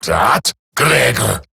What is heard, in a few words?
Surprised Male; en; Dialog; Barks; Battle; Grineer; Eximus